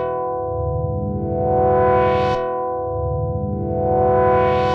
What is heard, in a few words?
Ambient Swells, Synth; Loops; Tonal; Ableton Live 12 Lite; Core Library